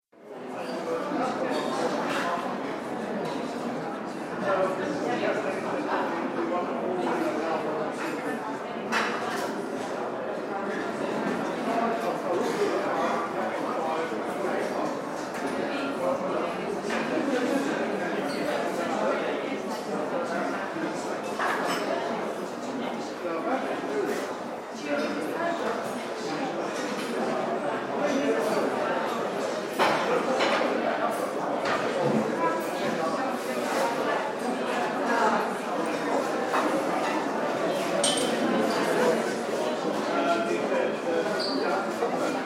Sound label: Busy Open Plan Hotel Reception And Restaurant; Building Sound Effects